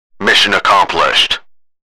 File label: mission accomplished